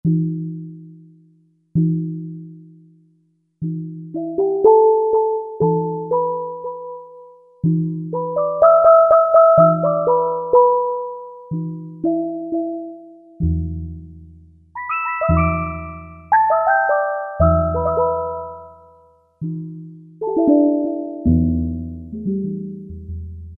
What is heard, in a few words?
Siam, Yamaha TG500